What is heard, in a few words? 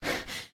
Breath; lethal company; ambience; windows